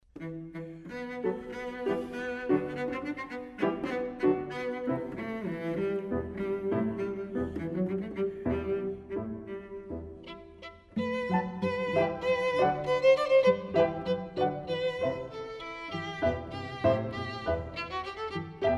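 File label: minature violin; juon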